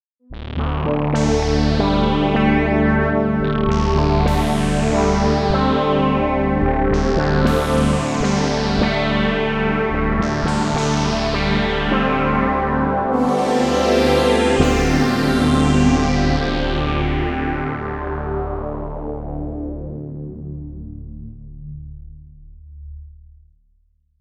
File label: OBX PWM; PRO12; opxpro2; Platinum